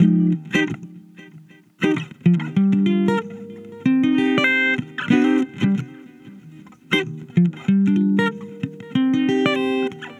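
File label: 094 Jazzy Guitar C minor, Fusion; Pro Tools Essential Loops; Digidesign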